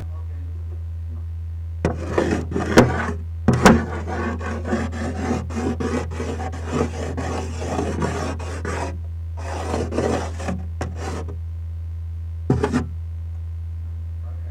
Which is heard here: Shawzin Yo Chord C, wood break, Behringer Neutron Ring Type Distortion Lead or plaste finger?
plaste finger